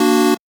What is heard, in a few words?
Chord